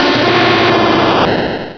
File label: typhlosion, cries; direct sound samples